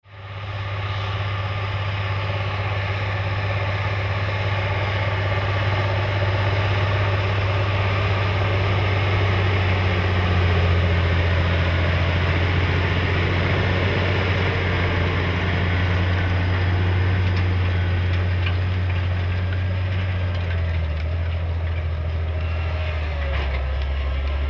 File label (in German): Challenger MT 875 B, Motor: Caterpillar; AGCO; Leistung: 600 PS